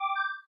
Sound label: NFC Start, LG phone ui